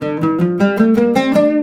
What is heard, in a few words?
LUTH D, Arabian Traditions, LUTH RIFF; USB Soundscan vol.43; AKAI